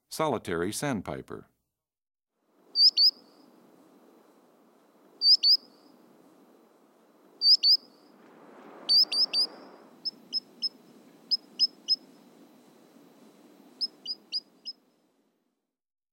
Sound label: Solitary Sandpiper, Sanderling, Upland Sandpiper, Dunlin, Spotted Sandpiper, Least Sandpiper; Birds; Eastern-Central